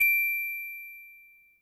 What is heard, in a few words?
get star; rabbit samurai 2